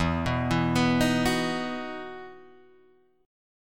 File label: E Major 7th Suspended 2nd E down Guitar Drop D